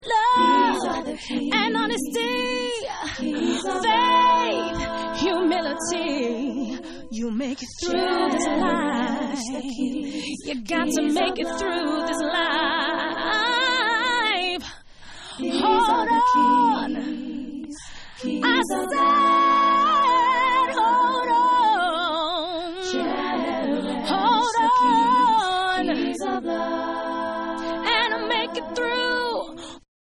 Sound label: Acapella